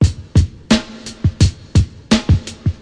86 bpm, deep hip hop, drum loops and breaks

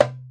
arabic perc, Arabic Percussion Kit

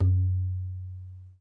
Ghe Open, Tabla; ETHNIC and WORLD PERCUSSION; Tabla Kit Large

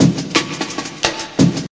172 ruff reverb attack, drum loops and breaks; 161 to 180 bpm

